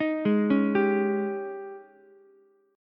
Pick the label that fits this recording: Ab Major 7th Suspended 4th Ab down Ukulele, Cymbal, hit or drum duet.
Ab Major 7th Suspended 4th Ab down Ukulele